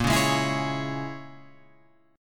Asharp Minor 7th Flat 5th Asharp down Guitar Open F; strummed